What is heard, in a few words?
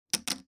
Poker Chips; This Is the Police